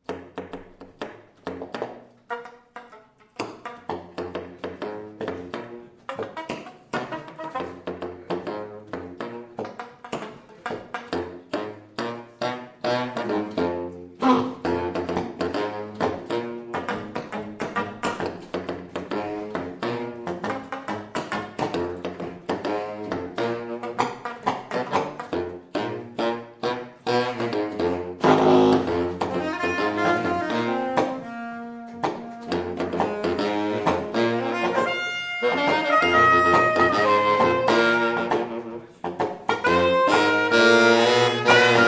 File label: SAX MAFIA